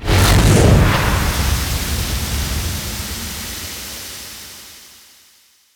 strafe; dota; clinkz

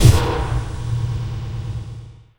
special delivery courier; dota; marci

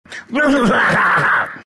vipe laugh; dota; viper

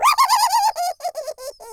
greevil laugh; soundboard

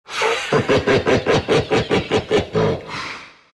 dragon laugh, dragon knight; dota

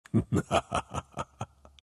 laugh; dota; lycan

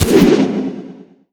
shackleshot cast, weapons; windrunner

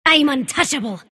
sylph attack emote; dota; dark willow

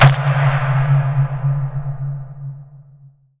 ink phantom attach; weapons; dota; grimstroke

